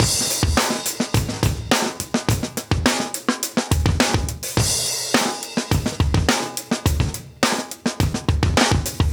breaks, Live Breaks

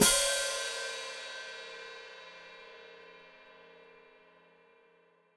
Hat Closed Open, Hi-Hat

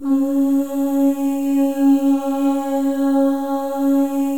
MOVING V1, Symphony of Voices; Spectrasonics

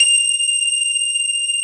GRAND PF F 2, AKAI S-Series

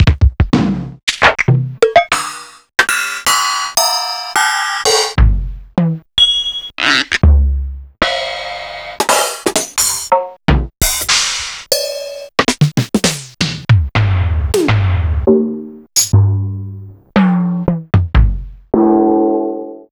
Fairlight CMI IIc

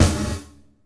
Gated Snare; Univerb; creative gate; Gated Reverb

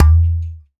BIG POT, POTS + PANS; Spectrasonics; Liquid Grooves

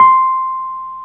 Wurly, Keyboards of The 60's and 70's

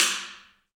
Kenyan Drum; Roland LCDP14 Africa VOL-2; Af.Hand Drm1

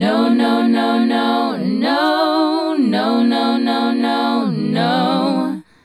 Female Group, Hip-Hop and RnB Vocals

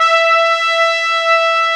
TRUMP; AKAI S-Series CD-ROM Sound Library VOL-2; TP+SAX1

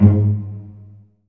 G#1, Virtuoso Strings; ILIO; Kirk Hunter Virtuoso Strings VOL-2; 10CL TRM FX

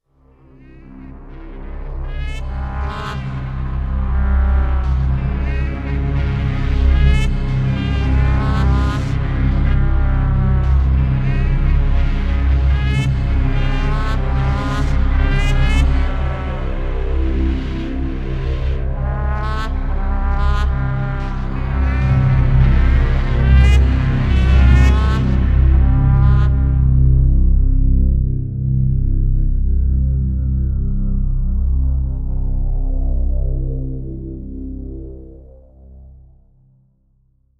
Trumpet Extreme; Bizarre Planet

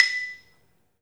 3D MARIMBA; 3D Sound; 3D Keys VOL-1

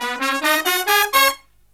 Killer Brass Riffs, HI; USB Soundscan vol.29 - Killer Brass Riffs; AKAI

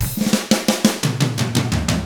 116 SHUFFLER, Drums

